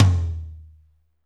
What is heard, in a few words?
TOM M R, Drumscapes Roland; Northstar - Drumscapes Roland